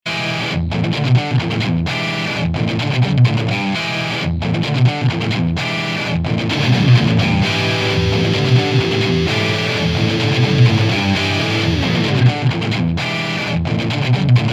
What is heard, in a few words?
p90, bass